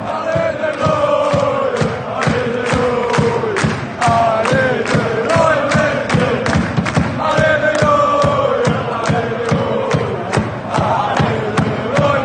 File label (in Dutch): allez de rooi met geel, fanchants